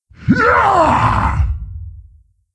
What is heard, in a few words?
Attack, Creature; Steelbreaker